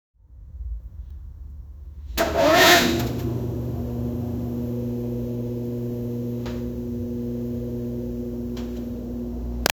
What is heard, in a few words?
recording starting